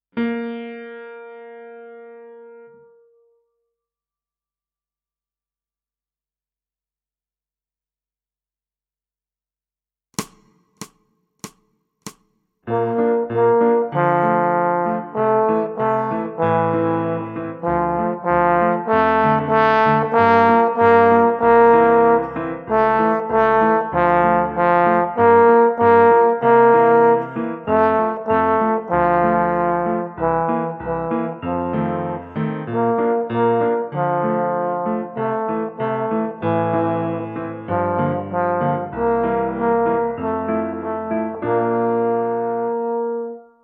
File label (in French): petit trombone et grand piano